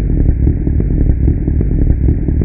Moving Block; Giza 2.0

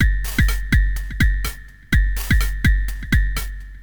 HOUSE 125

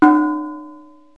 soft gong; Wired for Sound Pro CD; Aristosoft, Inc.; music

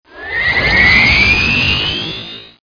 Hyper Start

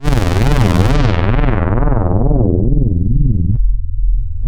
fall, Synth

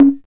78 MID TOM

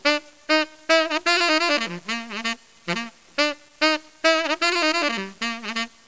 Saxo; MOBICLIC 9

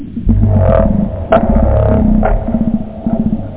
vocal

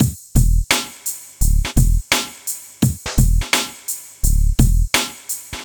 Drums Bass; Logic Fun

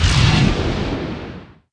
Fire Red Missile; SWARM

